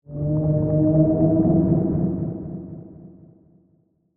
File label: Sea Creature Moan, Horror, Under, Water, Whale, Song; Monster; BlastWave